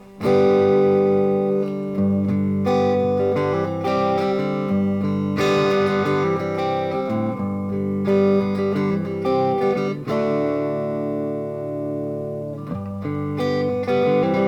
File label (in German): Hals PU crunch